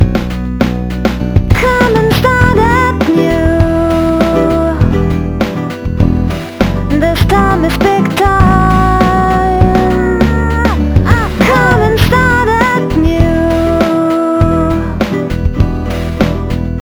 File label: Guitar tape ugly